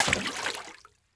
Liquid Water Water Flower Canister Dropped Into Water